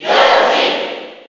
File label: Cheer; Yoshi; SSB4